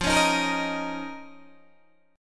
F 7th Suspended 4th Sharp 5th F, Guitar, DADGAD; 15,16,13,15,13,13

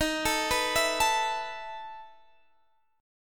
E Major 7th Suspended 4th D sharp

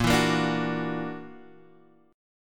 F sharp Augmented 7th A sharp

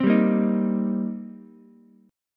C Minor 7th C Ukulele; Cm7 strummed; JGuitar

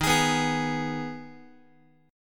D sharp Suspended 4th Sharp 5th, Guitar; strummed; JGuitar

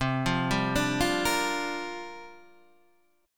C 9th Suspended 4th C down

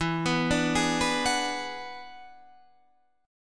E 9th E, guitar

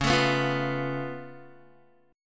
Db Augmented 7th Db down Guitar; strummed; JGuitar

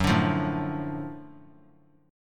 F sharp Minor Major 7th Sharp 5th F sharp down; strummed

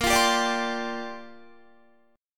A sharp 6th Add 9th, Guitar; A#6add9; strummed; 18,20,17,19,19,19

